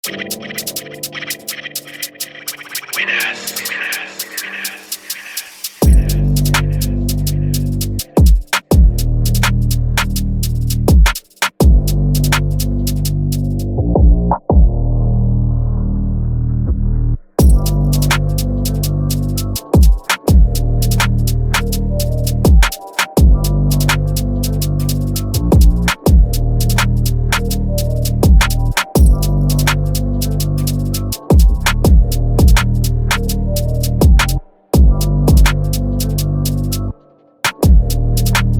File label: instrumental